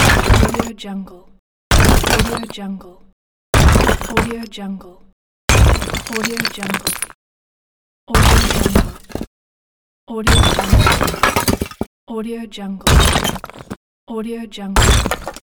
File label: stone smashing pack